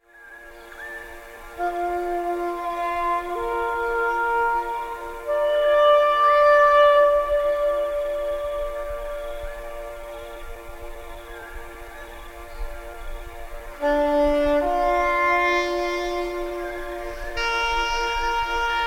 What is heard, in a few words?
Sax